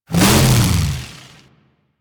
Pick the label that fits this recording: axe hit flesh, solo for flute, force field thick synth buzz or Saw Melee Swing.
Saw Melee Swing